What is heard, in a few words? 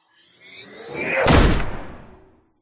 science fiction gun power up then shoot; zapsplat